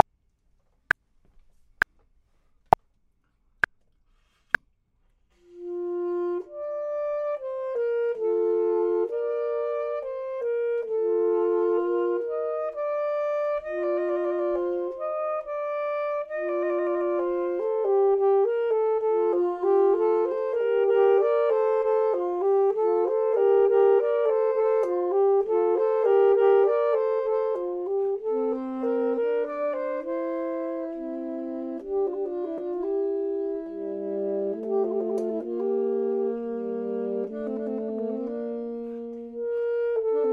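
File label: both, Saxophone and Clarinet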